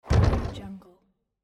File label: bicycle jumping off ramp kicker; urban sounds